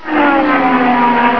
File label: CAR; BigMax nº 7; SENNA